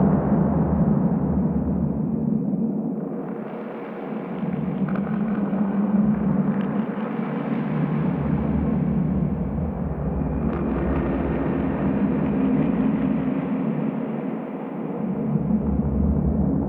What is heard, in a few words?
Ambient Loop; Ambience; Warframe; Sacrifice; Vitruvian Memory